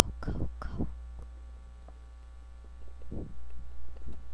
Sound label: cow